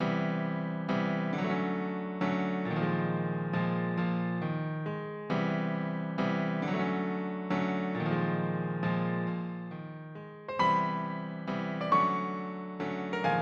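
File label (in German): Midnight, Jazz-Stücke für Klavier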